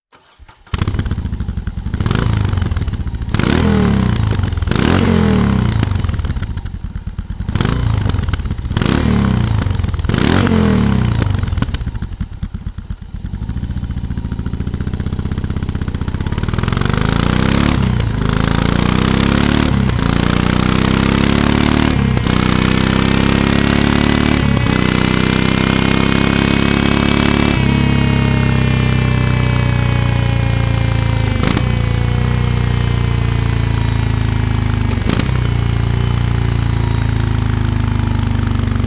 BMW R18 Classic; REMUS; Aerotechnik

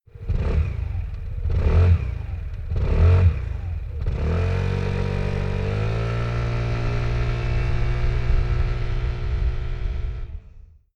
Piaggio Vespa GTS 300 EU5 SERIE; Aerotechnik

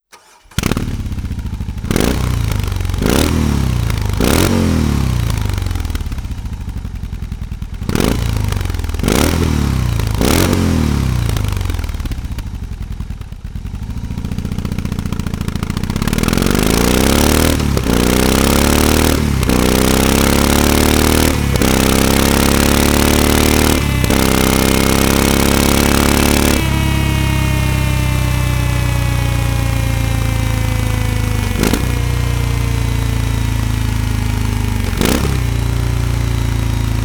BMW R18 Classic Serie; Aerotechnik

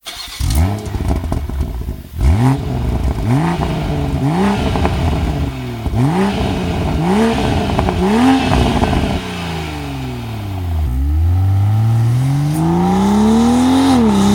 BMW M440i Axle-Back; REMUS; Aerotechnik

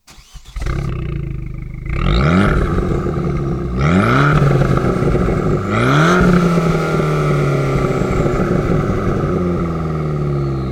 Fiat500 Abarth Remus; Aerotechnik

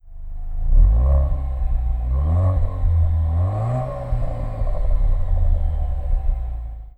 Alfa Romeo Guilia Veloce SERIE; Aerotechnik

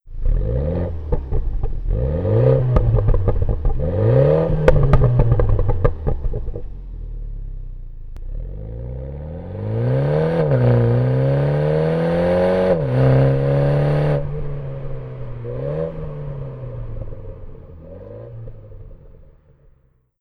Mini Cooper JCW Clubman F54 OPF SERIE; Aerotechnik

